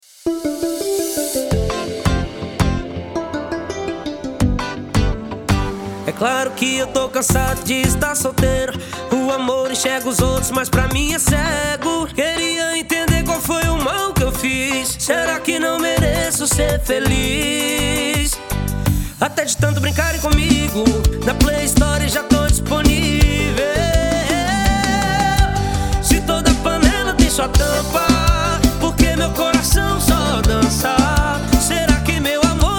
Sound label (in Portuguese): piseiro forro romantico